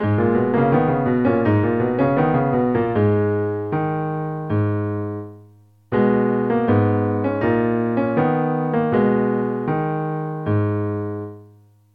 practice dotted 8th note in A flat minor G sharp m; yobiren